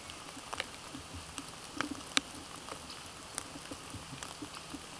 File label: Panurus biarmicus